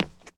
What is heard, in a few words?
concrete step; Golden Crayon Sounds